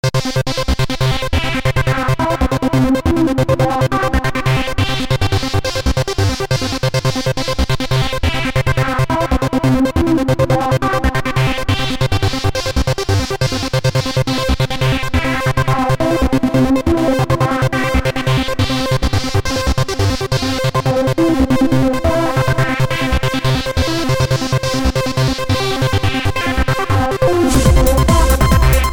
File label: wt plus arp; midibox sid